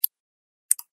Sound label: Phone Lock Unlock